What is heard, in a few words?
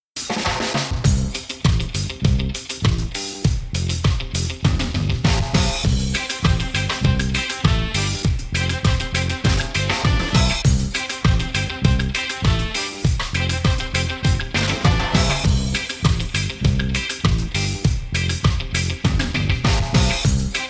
reggae